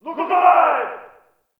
ambient campsite yells; Warframe; Tau

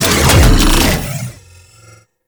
Grendel Deluxe Bowl Stop; Warframe

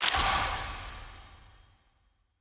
Retrieve Item Pickup A

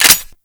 Uzi Reload Clip In; Tenno

